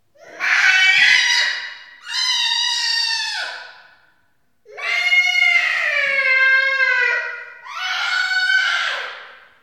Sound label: Baby Scream